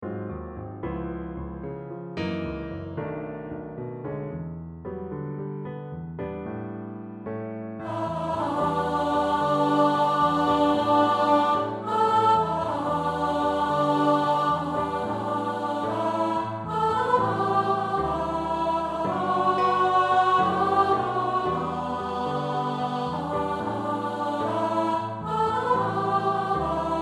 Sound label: Alto; Kyrie